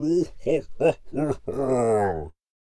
Attack Vocals

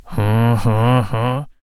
Deadeye Attack Vocals

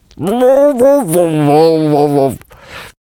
Death Vocals

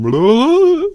Healer Attack Vocals

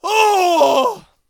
Devout Gauntlet Attack Vocals

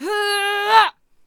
Bomb Thrower Attack Vocals

